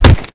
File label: long kick